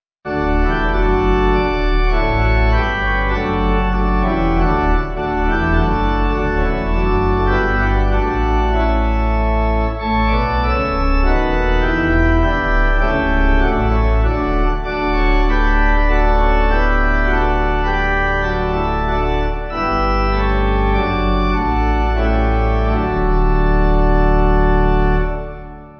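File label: Pipe, Pipe Organ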